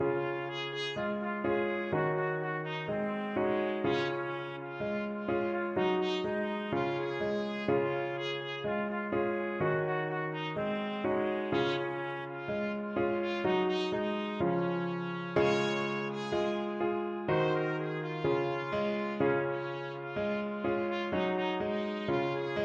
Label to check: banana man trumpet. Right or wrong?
Right